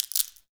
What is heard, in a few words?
foley tall grass crunch, forest